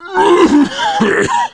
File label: cough; Messiah